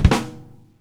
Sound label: FILL